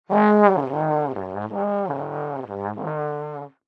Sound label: Cartoon Trombone Weird, Silly creature, horn noises.; Comedy/Cartoon; Funny Sounds; LynneMusic